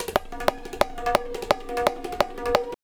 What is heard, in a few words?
tabla, 175 bpm; Zero-G; Pure Tabla